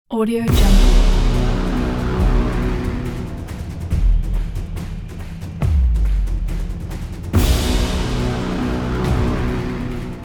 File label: football logo pack